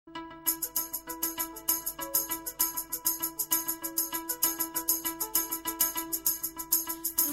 lumia softest sms; ringtones

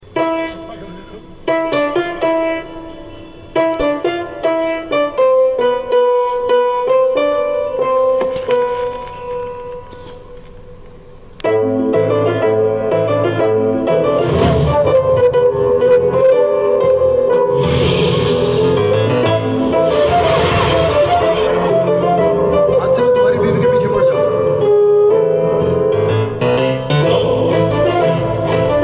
Piano

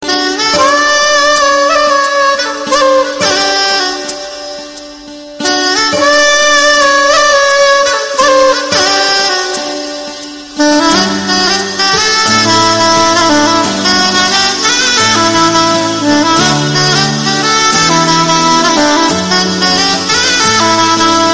melodious saxophone; classical

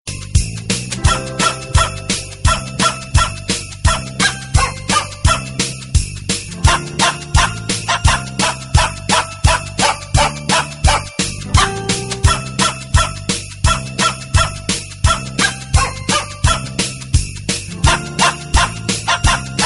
jingle bell puppy; ringtones

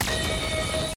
Beam

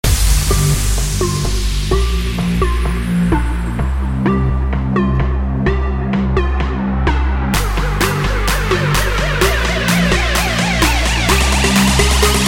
trap

